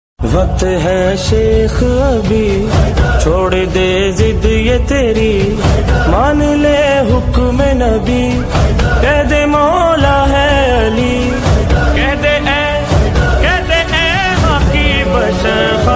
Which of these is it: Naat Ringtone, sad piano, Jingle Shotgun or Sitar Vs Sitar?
Naat Ringtone